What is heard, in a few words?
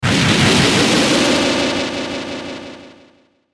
Ascend Static Delay Pulse; Blastwave